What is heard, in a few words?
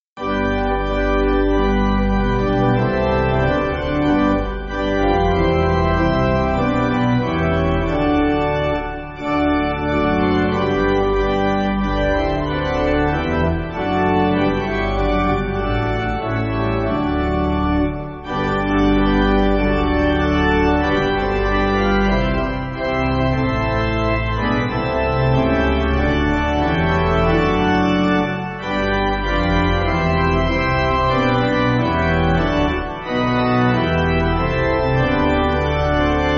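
Pipe Organ, church backing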